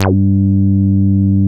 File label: G3, Bass; E-MU Producer Series Vol. 6 – Analogia Project; Boom da Bass